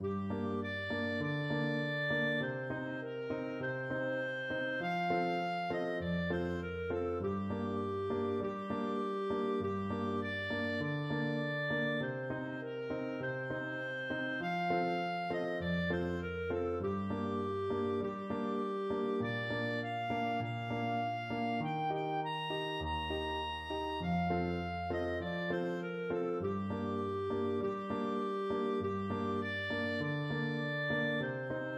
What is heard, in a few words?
clarinet; horses